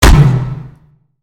Robot Get Hit Dry; Lego; aronwk collection; ndaudio; creatures mechanical

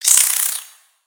Spider Angry; Lego; aronwk collection; ndaudio; creatures mechanical

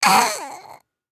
Strombie Die, creatures organic; Lego; aronwk collection; ndaudio

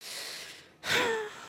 sigh; emotes

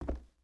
Foot Wood Boot Walk; footsteps

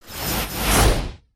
Login Whoosh

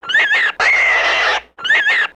Pet Bunny Rage; creatures pets; Lego

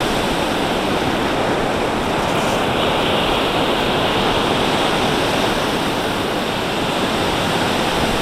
Waves Intense; ambience pet rock

